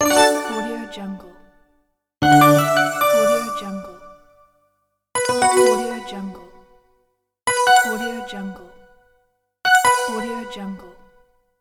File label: ui button